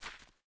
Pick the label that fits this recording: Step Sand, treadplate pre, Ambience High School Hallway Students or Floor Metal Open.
Step Sand